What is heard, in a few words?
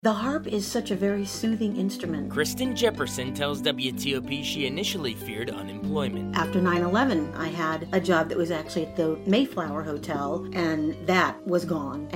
HARP PLAYER